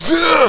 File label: oooff; quake